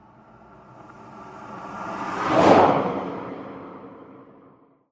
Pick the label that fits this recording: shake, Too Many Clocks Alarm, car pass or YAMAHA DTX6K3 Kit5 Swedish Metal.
car pass